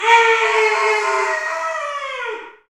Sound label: Bullhorn, Afro Horns, Africa